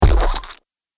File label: Mud Splat; Slimy Sound Effects; sound design sound effects